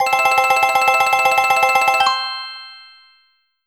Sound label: jackpot